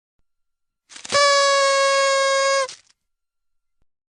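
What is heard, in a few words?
paper pipe sound; zvuk bumazhnoj dudki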